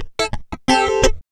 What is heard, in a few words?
FUNK, FUNKY GTR